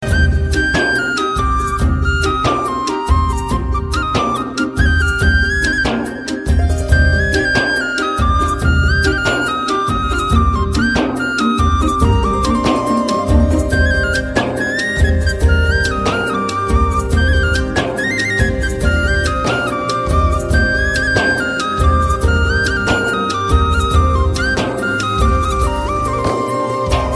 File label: Flute